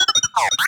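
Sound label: Sci-Fi Droid Robot Emote Beeps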